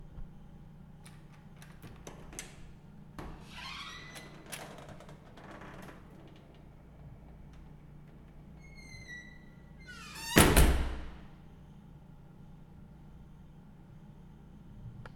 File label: Door Opening And Closing Stairwell